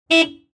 Transportation Cars Honda Civic Honk Horn Short

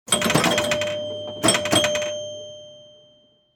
Technology Electronic Pinball Machine Ball Launch

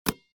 Sports Ball Basketball Caught With Hands

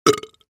Human Male Burp

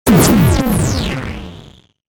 Production Element Title Transition Blast Impact

